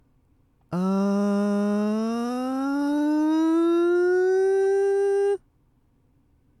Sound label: glissando mix